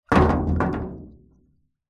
water tank wood batton drop into full small water tank water splash; industrial; metal impact; zapsplat